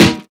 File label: natural snare g sharp key, Loudest frequency: 1502Hz; snare drum samples; one shots